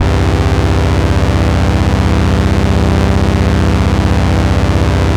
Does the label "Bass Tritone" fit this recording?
Yes